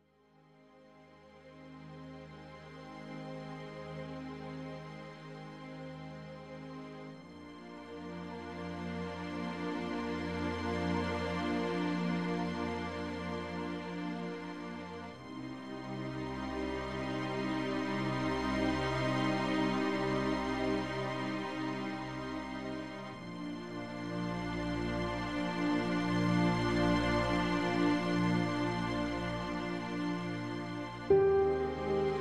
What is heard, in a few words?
Most Beautiful Dramatic Orchestral Music